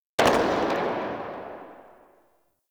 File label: Miter Tail, Weapons; Warframe; Tenno